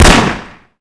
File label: Glock23 shoot